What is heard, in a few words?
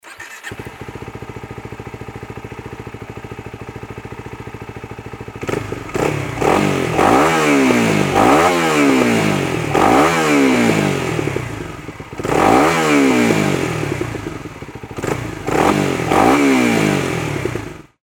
ninja250sl lci n pro baf